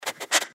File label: giant rat sniff; npc